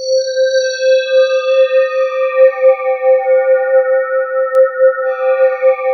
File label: BELL STEE, ATMOSPHERES; Sound Gallery - Charged